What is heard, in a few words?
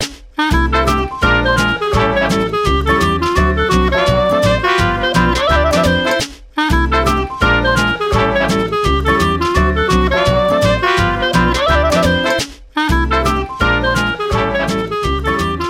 Diner Dressup Intro; the princess and the frog; Frog Music